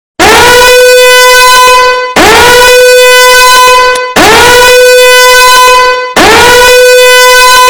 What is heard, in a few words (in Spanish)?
alarma alerta